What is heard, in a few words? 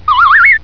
Whistle Shrill; iLinux